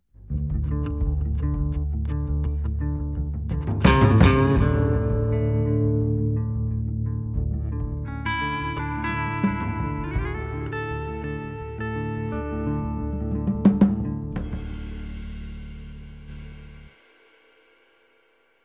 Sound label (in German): atmosphaerisch Drums Gedaempft Bass GT